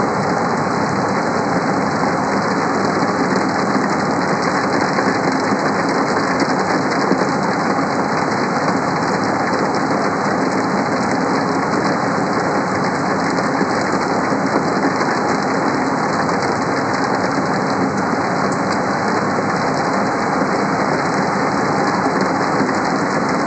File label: Window Seat